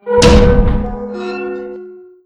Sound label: scare jump out